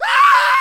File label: SCREAM; Synth FX Misc